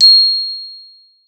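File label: GLOF C4, glockenspiel; Xsample VOL-4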